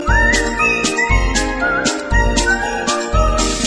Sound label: Song